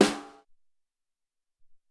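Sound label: Brush Snare 1, Alesis SR-18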